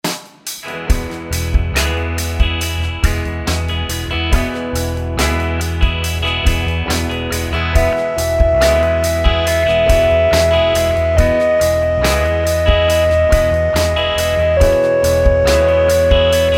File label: scale degree